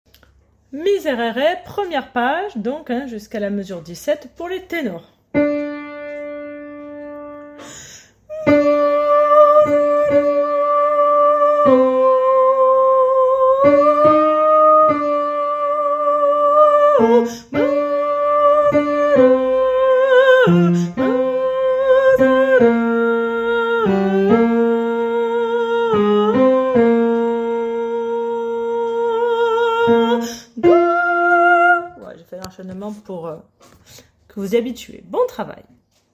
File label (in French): Tenor